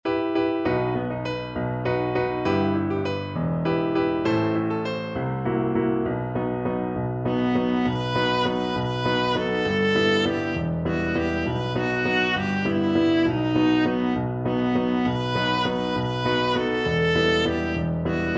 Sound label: viola